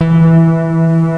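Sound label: SynBass, synth bass; Star Trek Audio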